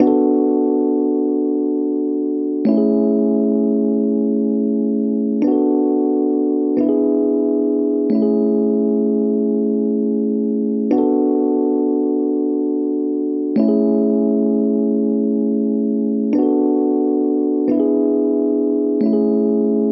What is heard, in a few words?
E-Piano 2-5-1 AMaj; Piano & Keys